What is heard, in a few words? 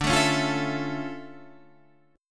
D Minor Major 9th D down; DmM9; strummed; JGuitar